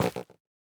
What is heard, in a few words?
Fungus break; minecraft